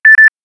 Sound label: Beep-beep, ringtones; Samsung; Galaxy Ace 3 CDMA SCH-i679